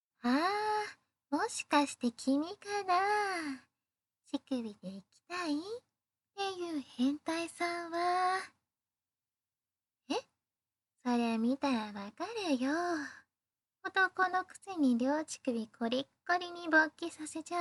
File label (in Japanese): エッチなお姉さんのリズム乳首オナニー